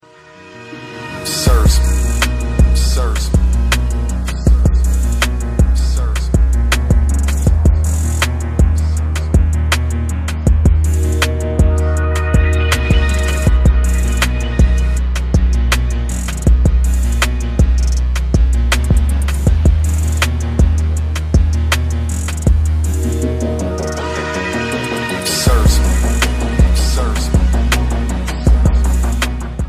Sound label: trap remix; stranger things